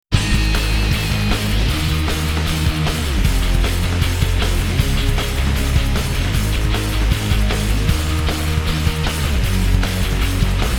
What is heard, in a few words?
no lead guitar